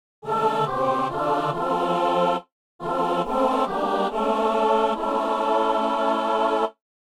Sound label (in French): exauce nous seigneur de gloire; Messe du 17 janvier à Saint-Denis